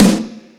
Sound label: All Snares, E-MU Pro-Cussion; drum machine